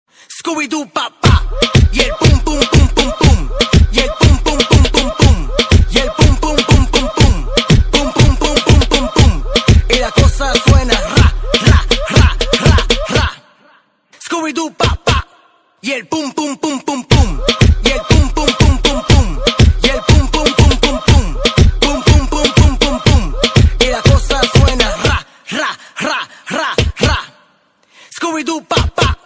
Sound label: Mobile Ringtone